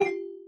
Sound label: plinko neg